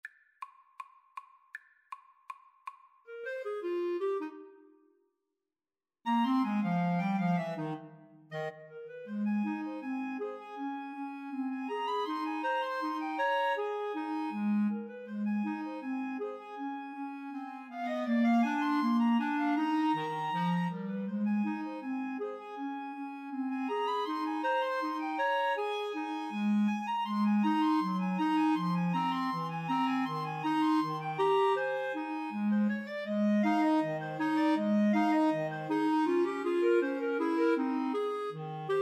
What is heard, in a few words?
Clarinet Trio